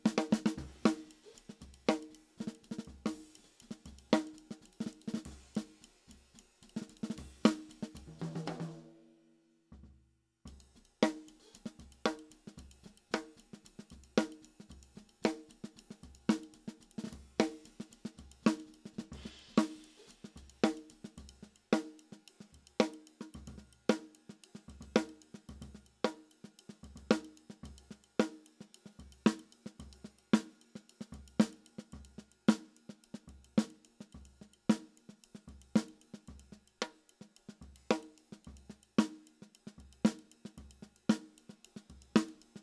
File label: Snare Top